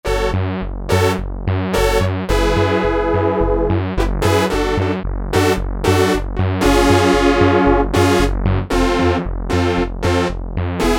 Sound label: Poly Synth 1, Roland W-30; Factory Performance Patches; Factory B